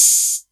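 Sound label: 808 Hat Open; Novation DrumstationV1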